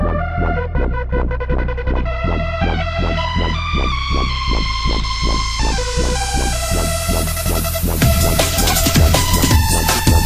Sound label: DUBSTEP; HARRY POTTER